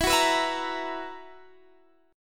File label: B Major 7th Suspended 4th, guitar chord